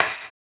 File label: HAMBONE; Blues & Soul